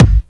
original bass drum one shot g sharp key, steel kick drum sound tuned to the G# note